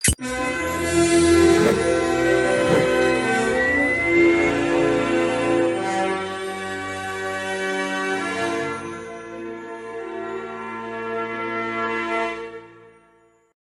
You Win; The Amazing World of Gumball; Dino Donkey Dash

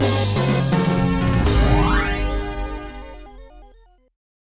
Fanfare; Jazz Jackrabbit 3

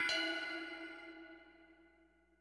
Bounce Hit

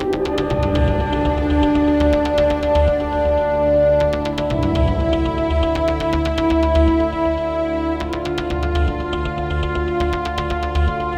Music Loading

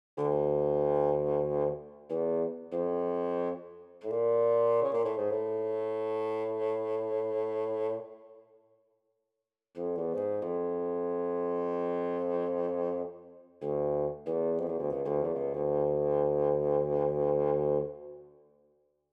Bassoon Melody A 94bpm pickup

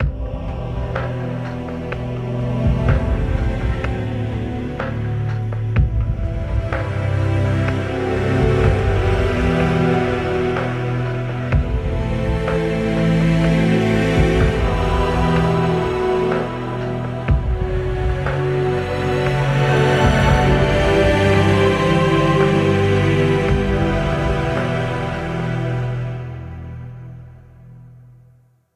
BGM; SOCOM U.S. Navy SEALs Fireteam Bravo 2; PSP

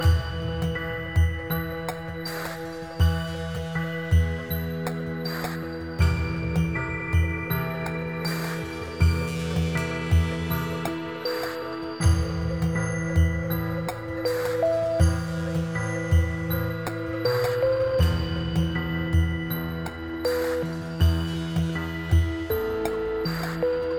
Tutorial Music; rise and shine linux ps4 switch windows xbox one gamerip 2017

